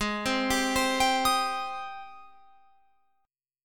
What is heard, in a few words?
Ab Major 7th Ab down Guitar Open F; AbM7 arpeggiated